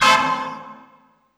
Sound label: brass, Drum & Bass 160 BPM; Ueberschall; Drum & Bass Flash Trackz